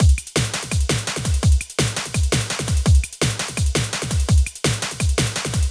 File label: DnB Trek 168bpm